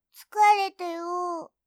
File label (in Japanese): Windows ログオフ